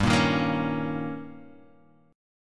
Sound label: D sharp 7th G, guitar